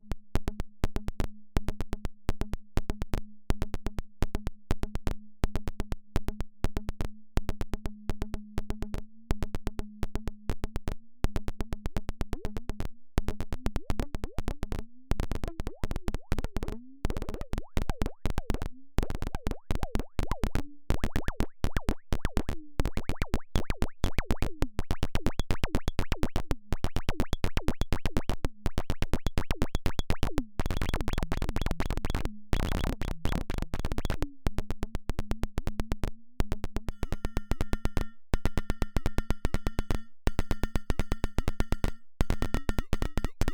Linnaeus Ping Mode Influence of Control CV at Audio Input; Rossum Electro Music